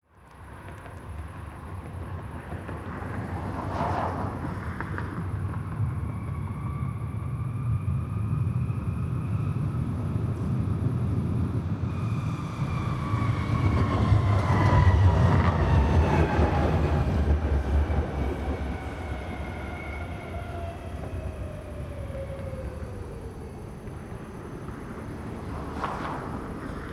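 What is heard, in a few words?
cars and tram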